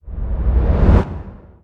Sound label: whoosh